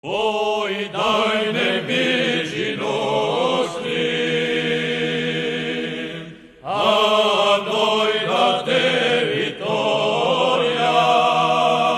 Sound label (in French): hymne corse